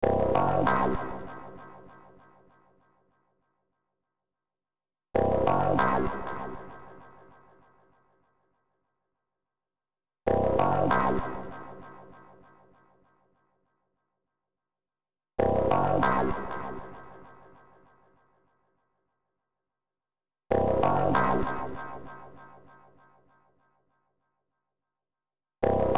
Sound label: space psychedelic ambient II frogs from sky